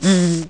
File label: BUZZ